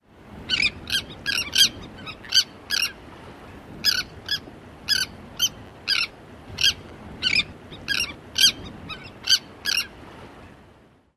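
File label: SANTER typical calls, sandwich tern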